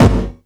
bass drum, Drum & Bass, 145 BPM; Ueberschall; Drum & Bass Resonance

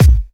Bassdrum; Best Service; House Definition VOL-1

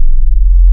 Sine To Oct Sine, wavetables; Animoog

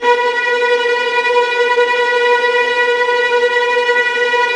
VIOLINT C#5, Strings; Synclavier; ILIO

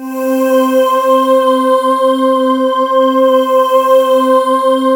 VOCAL PAD; Sound Gallery - Charged

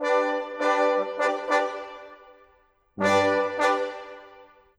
Brass; Sonic Foundry (Sony Creative Software); Orchestral VOL-2

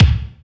BD, Dance; Sound & Vision; Gigapack I CD 1

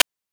PITCHED NOISE; NES DPCM; MIDINES

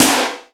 SD, Yamaha RY-10, Drums

